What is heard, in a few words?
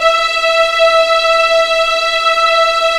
E5 EXP MF, VIOLINS; East West; The Ultimate String Collection